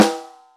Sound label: Snares, Real